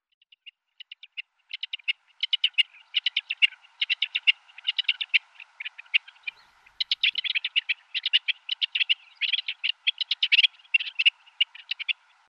Coturnice Alectorix graeca; Versi Animali; Il Forum della Fotografia Naturalistica

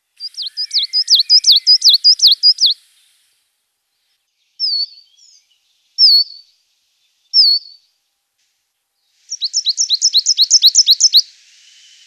cincia mora parus ater; Versi Animali; Il Forum della Fotografia Naturalistica

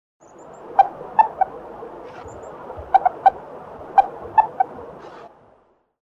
Ermellino Mustela erminea; Versi Animali; Il Forum della Fotografia Naturalistica

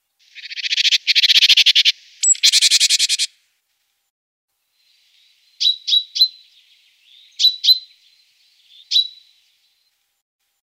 Cinciallegra Parus major; Versi Animali; Il Forum della Fotografia Naturalistica

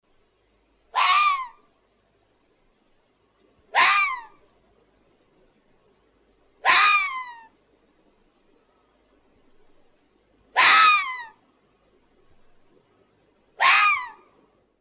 Volpe Vulpes vulpes; Versi Animali; Il Forum della Fotografia Naturalistica

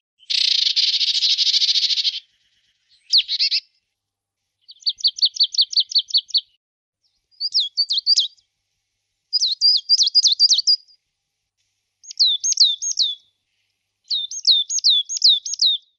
Cincia bigia Parus palustris; Versi Animali; Il Forum della Fotografia Naturalistica

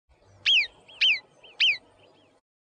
Marmotta Marmota marmota; Versi Animali; Il Forum della Fotografia Naturalistica

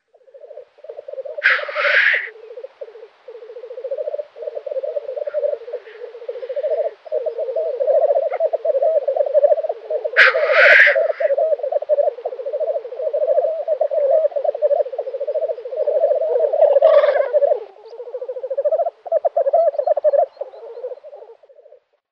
Gallo Forcello Fagiano di monte Tetrao tetrix; Versi Animali; Il Forum della Fotografia Naturalistica

